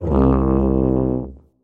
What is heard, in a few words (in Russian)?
zvuki tuby